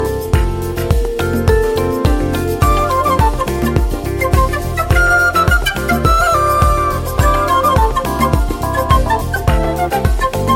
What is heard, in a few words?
flute